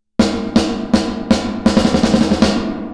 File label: Plate Friction Damp; UVI